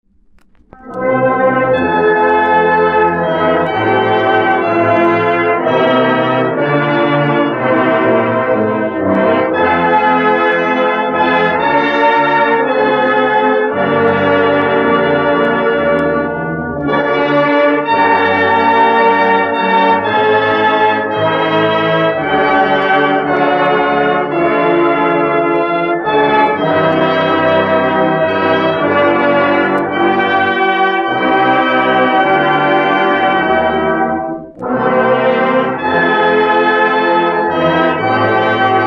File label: High School Band Alma Mater; Piqua